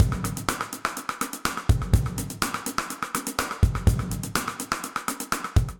Sidestick Dark Plate Verb 124bpm; Session Drums; Session Drums Club; Ableton; Factory Packs

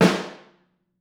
Room, Snare

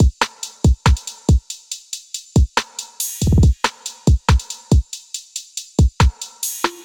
Enigma Kit 70 bpm, Trap Drums; MPE Enigma Kit; Trap Drums by Sound Oracle; Ableton; Factory Packs

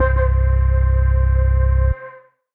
Bass

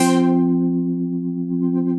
Fuzzy Plonk, Synth Keys; Ableton; Glitch and Wash

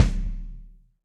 Kick Felt Reso Classic; Drum Hits; Ableton; Factory Packs; Session Drums Club; Standard Hits

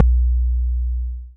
digital tonal, Kickbank; Ableton Foundation FreePacks

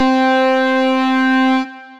Harpsichord Battalion, Synth Keys

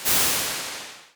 Big Garage; Bigger Spaces; Ableton; Convolution Reverb

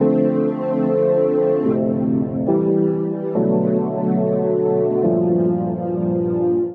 D Major 142 bpm, pads; MIDI Clips; Tonal; Cavity Soup; Skitter and Step; Ableton Factory Packs

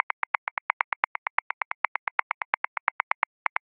100 Bell Groove; Grooves; Ableton; Factory Packs

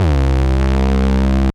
Cannibal, Analog Bass; Ableton; Factory Packs; Retro Synths; Analog Bass Vol 1

